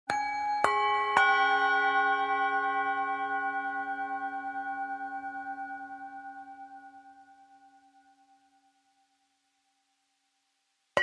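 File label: Village Bell music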